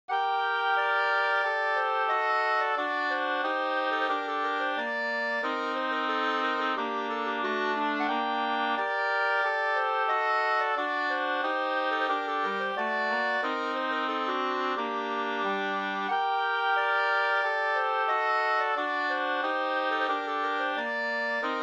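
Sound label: polska, three-part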